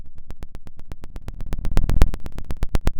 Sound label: Sound of a fire crackling